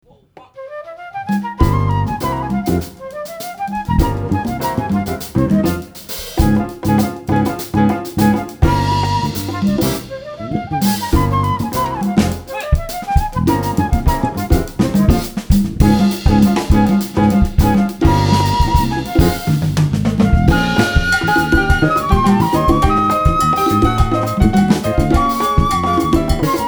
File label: Latin Jazz